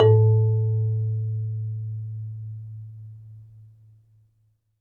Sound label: MARIMBA B; ILIO